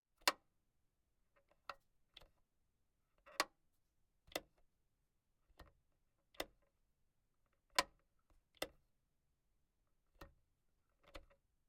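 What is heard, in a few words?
interior house wall light switch close; sonniss gdc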